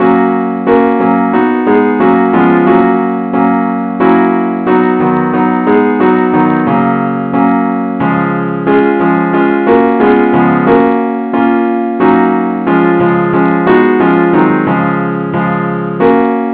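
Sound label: advance accompaniment key ges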